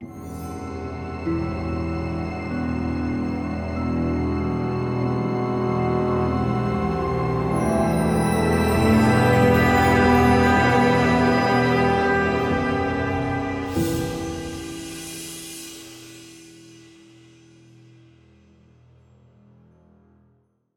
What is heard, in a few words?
Hand Transition; Groot